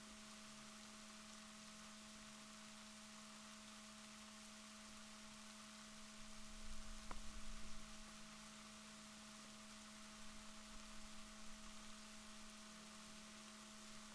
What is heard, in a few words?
rorquals negatif, Balaenoptera Physalus Chile